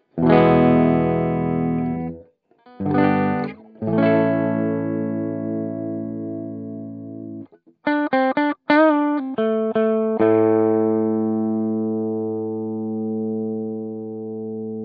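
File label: sunset cleans, guitar; schecter; sunset custom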